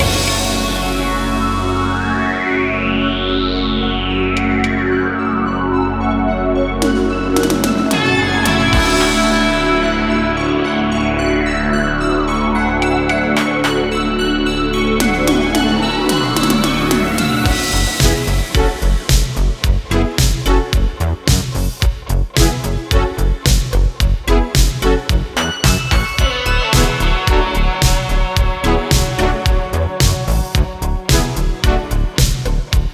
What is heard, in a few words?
ambience Music; netent